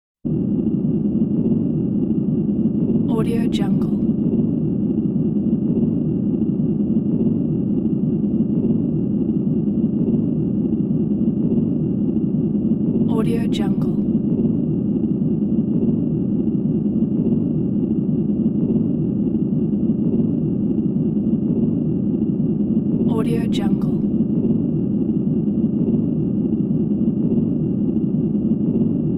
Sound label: synth spaceship interior; hollywoodedge